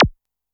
korg trident

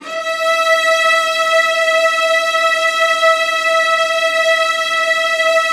VIOLAS F#5; ILIO; Synclavier Strings

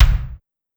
Kick, Clavia DDrum AT, Pitched Down

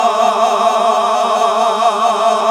GOSP. AAAHH

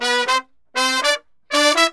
120 AM L, BRASS SAMBA; Best Service ProSamples vol.14; AKAI

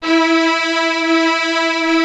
ARCO STRINGS; OMI - Universe of Sounds

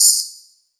Jungle Oneshot; Sonic Foundry (Sony Creative Software); Esoterik Beatz

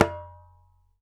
ASHIKO, African Perc

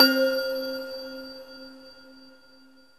LINEAR SYNTH

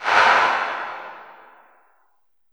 LH C909, REVRB LARGE HALL; TR 909

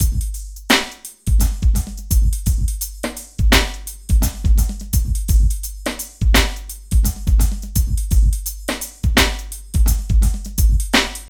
SLOW MOTION 85

